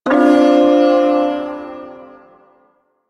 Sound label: Ersatz Shawzin Min Chord; Warframe; Duviri Ersatz Shawzin